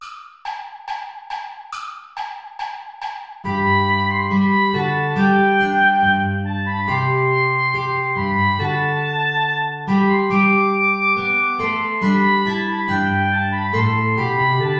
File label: ballet play along without guitar; praetorius michael